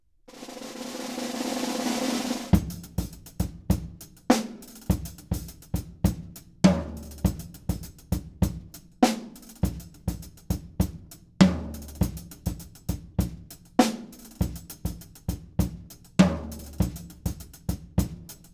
Percussion Imaging Tests, Drum 6 Feet, Dry Studio: Test